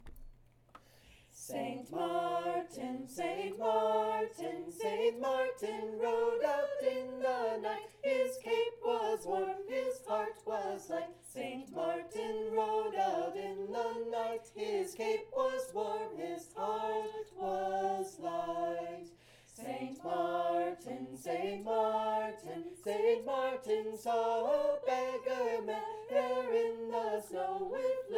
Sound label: lantern walk, saint martin; Cincinnati Waldorf songbook